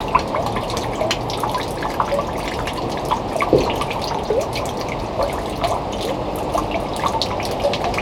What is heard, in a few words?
GF Water Drippage; gnarled forest